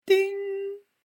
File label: ding